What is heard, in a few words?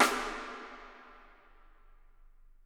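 snare drum rim, right; Miroslav Vitous - Percussion & Harp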